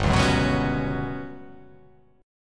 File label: G Major 7th Flat 5th